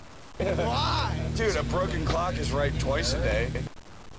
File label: broken clock